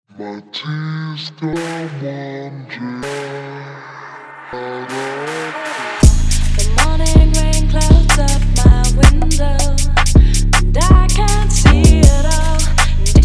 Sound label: drill